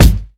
short steel kick drum g sharp key, Royality free kick drum one shot tuned to the G# note; kick drum samples